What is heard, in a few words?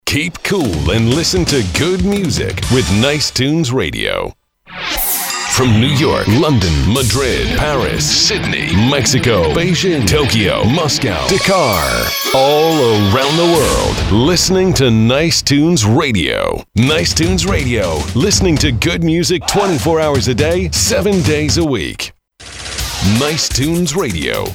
jingle us; ab studio